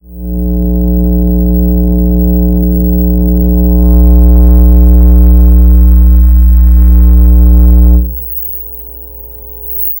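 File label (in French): son du déploiement du, deploy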